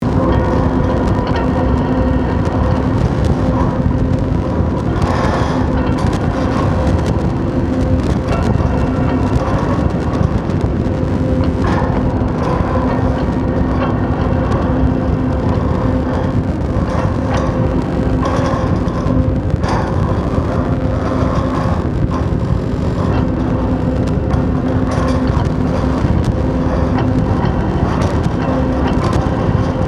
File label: Ambience; The Idea Factory